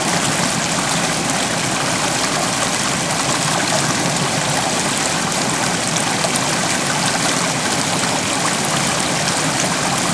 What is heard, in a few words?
water stream fast; Call of Duty Modern Warfare